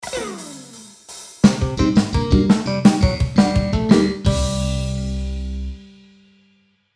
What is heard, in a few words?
Comical funny moment cheesy band sitcom